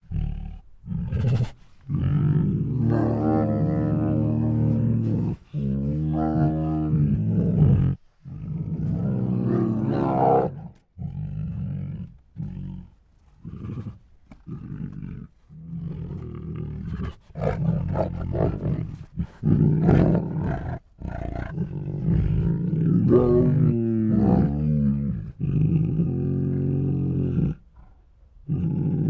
Two Tigers Make Many Hungry Huffs, Moans and Roars; Sound Ideas